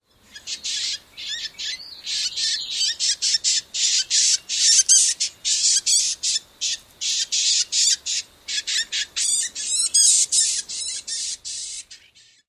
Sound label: harsh scraping notes